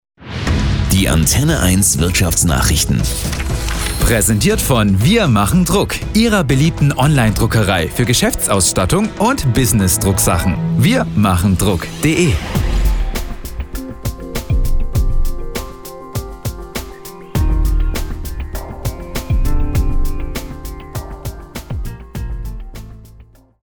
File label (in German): opener; wirtschaftsnachrichten